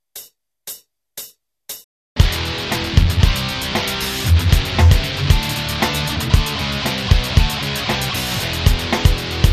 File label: tap jam